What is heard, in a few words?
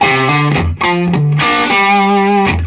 SOLO G, Vintage Blues Guitar